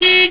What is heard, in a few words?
horn